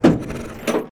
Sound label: Harvester Go Lever Push; moul-assets